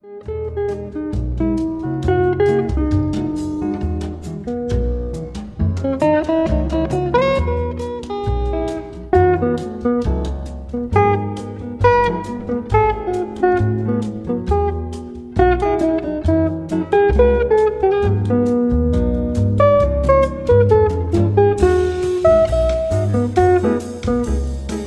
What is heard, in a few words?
nocturnal jazz melodies Night Serenade